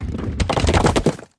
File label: avalanche; eldorado